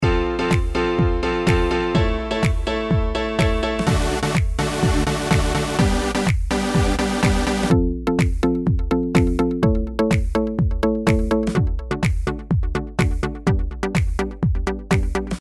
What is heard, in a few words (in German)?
Triebwerk synth